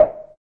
electro perc; Hip Hop; Precise Electro Percussion; style kits

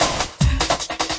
151 thick rolling two step; 141 to 160 bpm

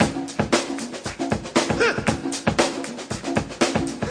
DRUM LOOPS and BREAKS, 118 bpm

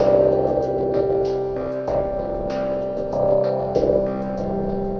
lopass drone melody; SYNTH AND ELECTRONIC LOOPS

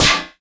Yamaha TX-81Z SD; drum machines

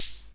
Ace Tone Rhythm Ace FR-1, drum machine; drum machines

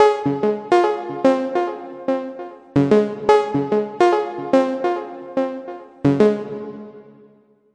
146 echo buzz verb; SYNTH AND ELECTRONIC LOOPS

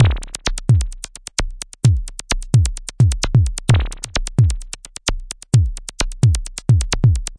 analog berlin, drum loops and breaks; soundsonic nks

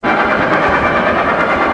superscary; Various Strange Sounds and Effects

